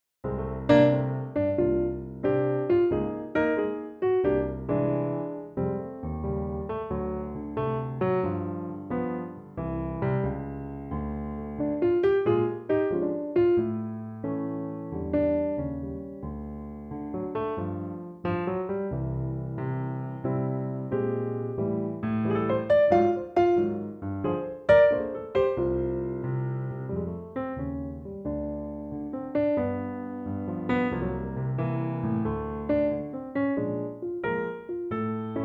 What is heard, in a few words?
F piano